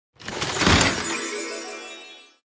scatter Win; jack and beanstalk

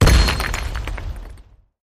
stamp wild appear; jumanji

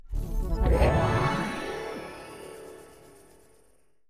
random Symbol Transform Pick; fairyhansel

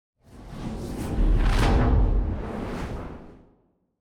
Evil Intro; arcane

